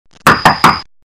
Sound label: Knock knock